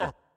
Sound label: Hover Hands; Going Under